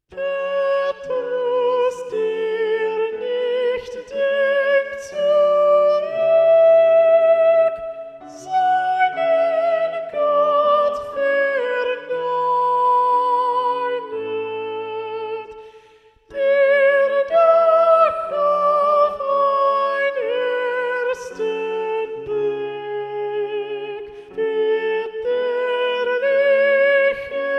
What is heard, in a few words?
Soprano